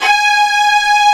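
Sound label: ARCO VIOLI, Tremolandi Violins; E-MU Producer Series Vol. 2 – More Studio Essentials; Composer; Tremolandi Vlns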